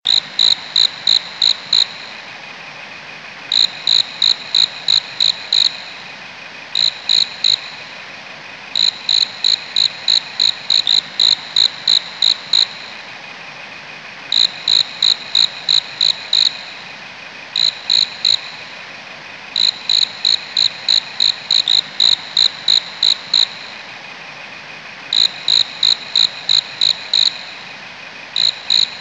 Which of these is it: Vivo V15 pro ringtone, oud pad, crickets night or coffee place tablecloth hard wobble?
crickets night